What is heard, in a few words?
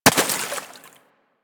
Bullet Impact Water; Warframe; DE; Weapons